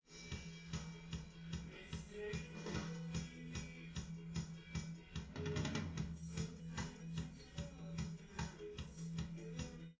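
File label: with drums, live rec; SiSEC11; underdetermined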